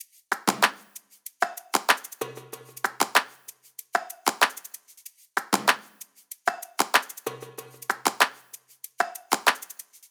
top loop, Drums, Loops, Top Loops, Pop; Slate; SD PASADENA Pop Sample Pack

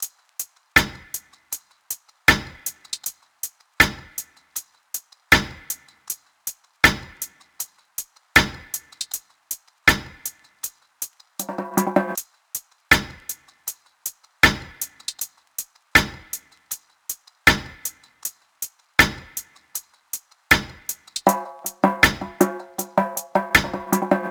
tops, Drum Sample Pack, Loops, Top Loops; SD SEISMIC; Slate

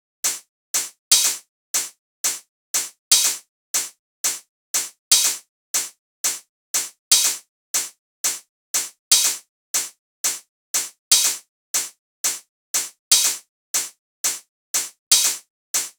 SEISMIC hats 120; Hat Loops; drum sample pack; Slate

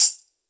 hats, Pop; Slate; SD PASADENA Pop Sample Pack

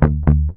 Bass Pick D, Electric Bass One Shots; Slate Digital BASSHOLE Sample Pack

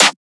clap; Claps; Slate; SD SEISMIC Drum Sample Pack

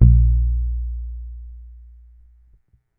Bass one shot Muted Bb, Electric Bass; Slate Digital BASSHOLE Sample Pack

